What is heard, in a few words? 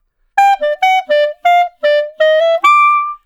Clarinet Solo; Sonic Foundry (Sony Creative Software); Jazz Solos and Sections